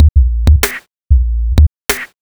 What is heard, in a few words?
simple spit, drum loops; hh drum loops